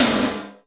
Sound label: GATE TOM; Crypton - DrumBase5000